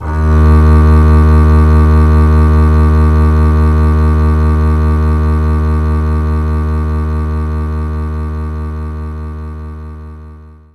Double Bass; knights try

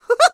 laugh success; Ice Age Scrat's Nutty Adventure

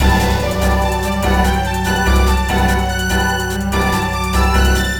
BGM STAGE BATTLE; Phantasy Star Zero

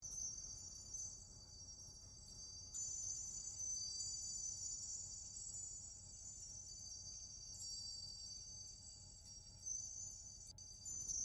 Floating Symbol Ambience

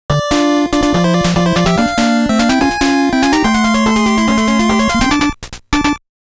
Game Start

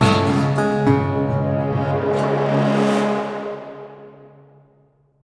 Stinger We Did It

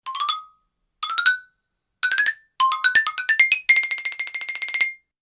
Music Sneaky; Nancy Drew: The Haunting of Castle Malloy

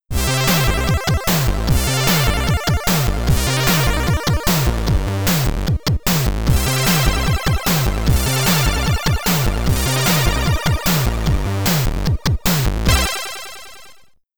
Stage Clear (SID Stereo); qix commodore 64

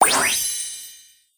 Transmission Gear Win; Yu-Gi-Oh! Master Duel

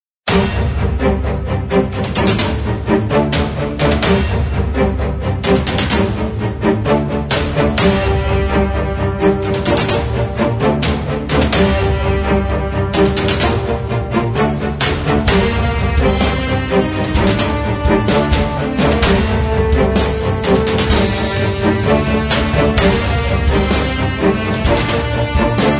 battle; warship battle 3d wwii joycity corp android

